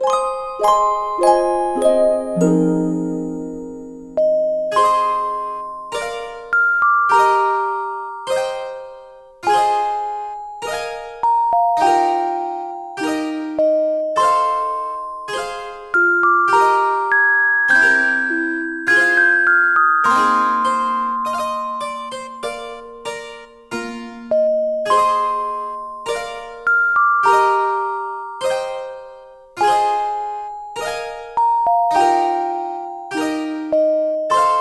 Handbell Harmony

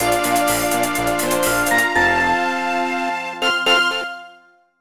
BGM Game End; minna no oekakiya san 2009 nds gamerip

